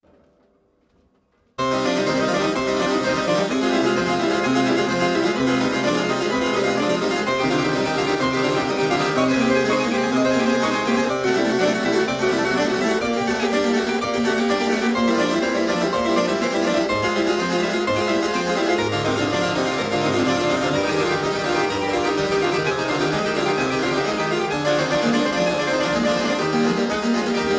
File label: Flemish harpsichord